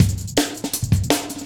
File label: Heavy Live B, Drum Loops, Jungle; Zero-G Jungle Warfare 1, 2 & 3